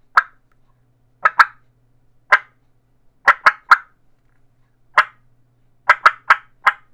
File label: clucks, turkey; Southland Sounds; southland ghost